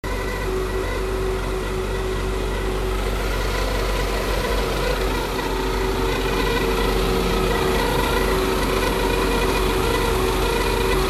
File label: mysterious g37 engine bay noise; infiniti g37 engine bay noise